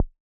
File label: button press; wayvr; wlx overlay s; wgui